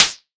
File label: Cwejman S1 Short Hit